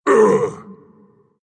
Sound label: pain, ru; Dota 2; Doom